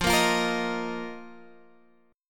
A sharp Suspended 4th F

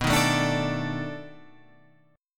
B 7th Sharp 9th, down, Guitar, Open F; strummed

